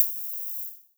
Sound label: HH, Dance Drums